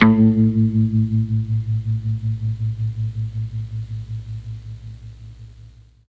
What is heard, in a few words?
A1 guitar, MKII mellotron